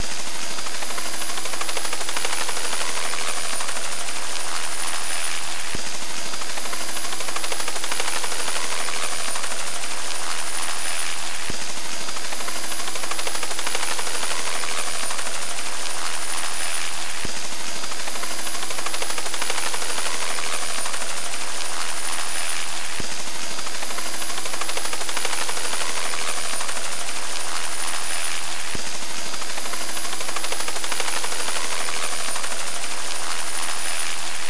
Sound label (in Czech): vrtulnik